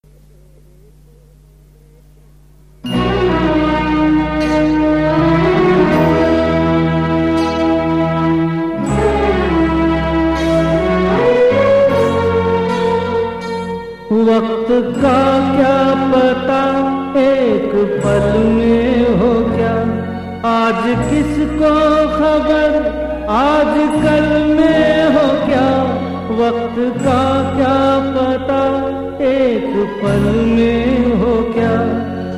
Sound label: male